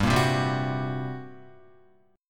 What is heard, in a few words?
G Minor Major 7th Double Flat 5th, G, Guitar; strummed; JGuitar